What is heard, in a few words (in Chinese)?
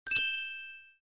麻将消除